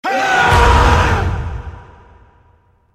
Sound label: colossus smash; varian